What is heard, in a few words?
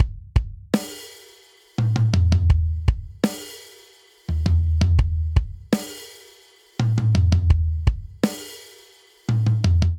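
Straight Seven Rock Intro 7/8 84 bpm, drums; Ableton; Factory Packs; Drum Booth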